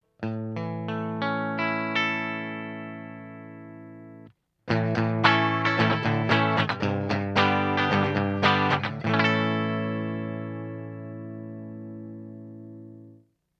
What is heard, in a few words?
Greco Ham clean